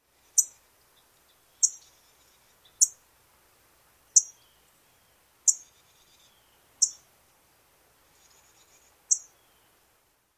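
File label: alarm chips, Orange-crowned Warbler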